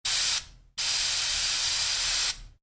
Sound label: Spray Can: Spraying; Spraying Sound Effects; Spraying (Sound Effects:Objects); Stuart Duffield